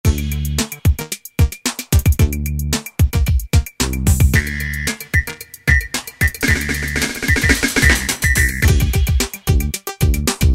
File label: Old Skool, Yamaha DJX-IIB